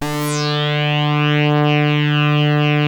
SAW RES WET, POLYRES SAW2, Synth; InVision Interactive; Keith Emerson; The Most Dangerous Synth and Organ

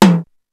DANCE SET, DRUMS

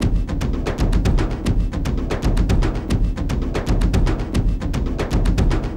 Vam, Frame Kendang; Sonic Foundry (Sony Creative Software) - Friendly Fire; Drums

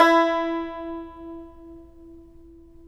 tar; Roland - LA Composer vol. 3

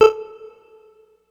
Syn Cowbell, Ensoniq ASR-X Pro

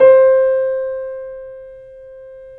YC7 piano, pp; Roland L-CD701; pp YC7 St

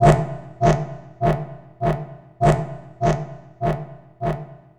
Hard Edge Beat, Electronic Beats; Sonic Foundry (Sony Creative Software); Myths of Technology

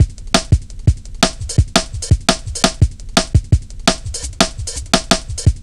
170, drum loops, drum & bass

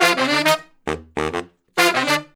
Horn Section

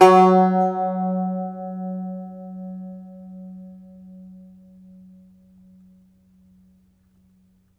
IRISH BAZ, Plucked Strings, Irish Bazouki; E-MU Producer Series Vol. 7 – Old World Instruments

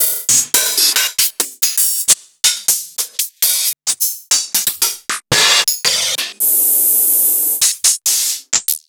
Open Hihats

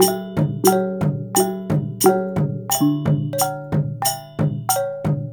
WYANG L, Heart of Asia; Spectrasonics - Heart of Asia CD2; W.KULIT

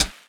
Hihat; Sonic Foundry; Sony Creative Software; Stylus Pressure Urban Grooves on Digital Wax; What Up

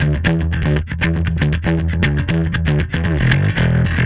Bass

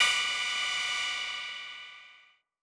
M Dynamic Tech B4; KG MegaSaur

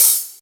hh heavy, Yamaha TG-500

